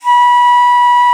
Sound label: SOPRANO, India Winds; World Combos; E-MU Producer Series Vol. 7 – Old World Instruments